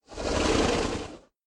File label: zombie horse idle; Minecraft